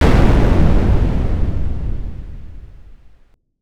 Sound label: explosion